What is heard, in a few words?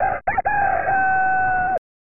Rooster